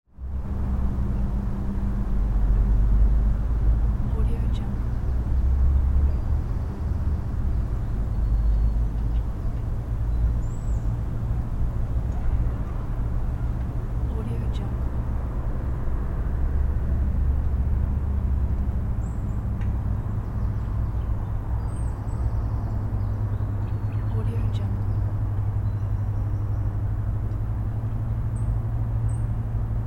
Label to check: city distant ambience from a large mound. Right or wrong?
Right